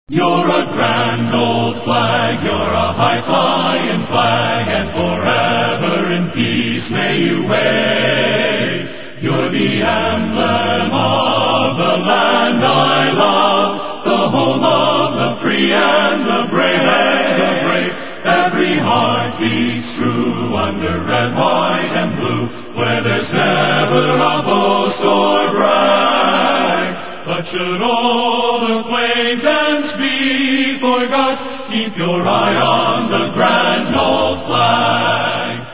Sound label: Accapella Male Choir; July4